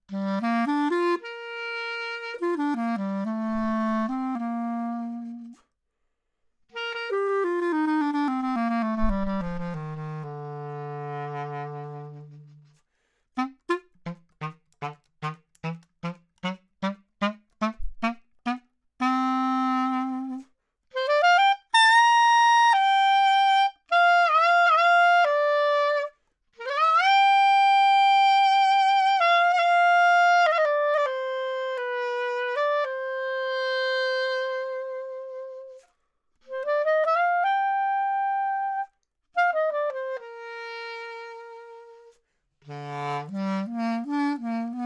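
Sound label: Clar Bb 20cm Mid, clarinet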